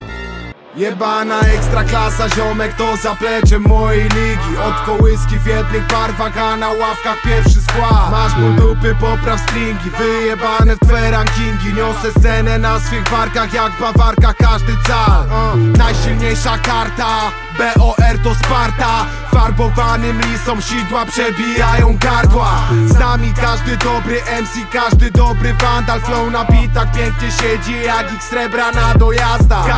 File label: cardio